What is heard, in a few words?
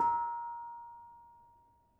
Bonang Panerus soft, gamelan